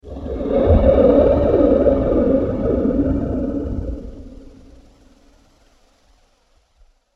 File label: Winds Of Darkness, Scary Soundscape; horror sound effect; scary videogames, and sinister halloween radio, tv, programmes